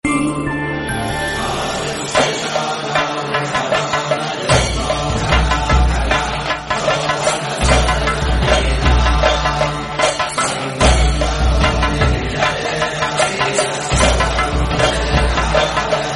Pelli Mantralu Telugu Ringtone

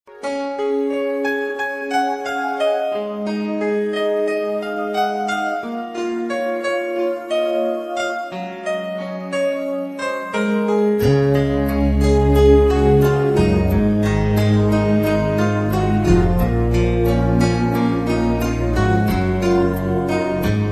Romantic Ringtone

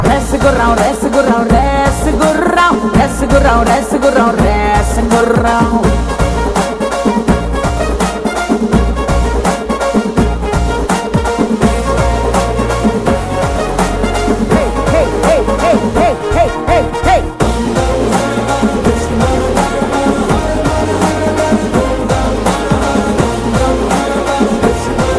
Theme Telugu Ringtone; Race Gurram

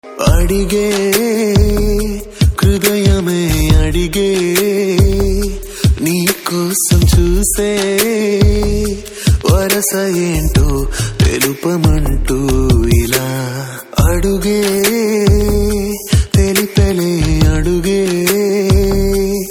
Male Version Telugu Ringtone

